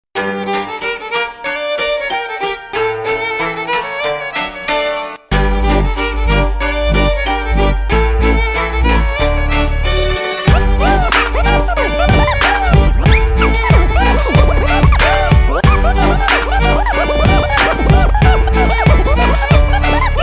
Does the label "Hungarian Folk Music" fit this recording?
Yes